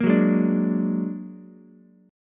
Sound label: A sharp Augmented 7th A sharp Ukulele; A#+7 strummed; JGuitar